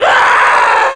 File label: burn; player